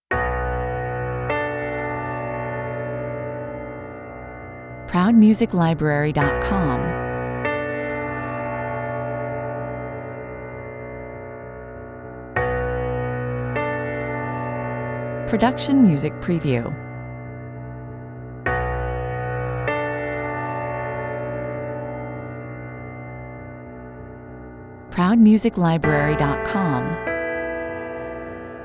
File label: piano only